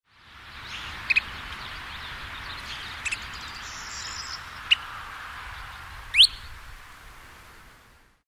kentish plover

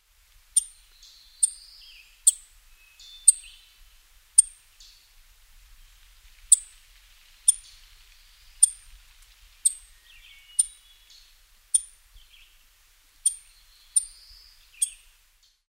white throated sparrow

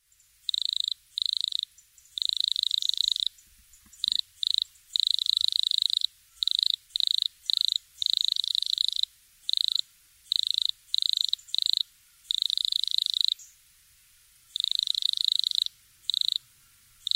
wood cricket

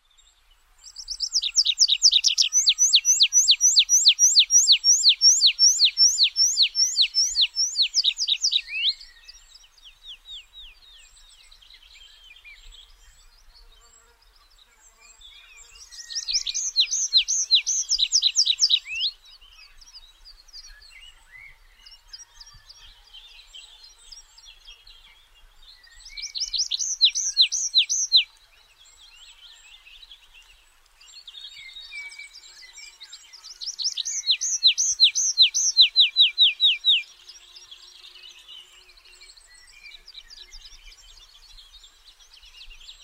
tree pipit

